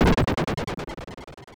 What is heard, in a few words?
fly off